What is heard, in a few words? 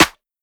clap full, Hip-Hop; Drum One Shots; SD CAICOS Hip-Hop Sample Pack; Slate